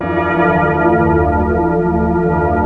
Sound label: YAMAHA FM; Rubber Chicken Software Sample Bank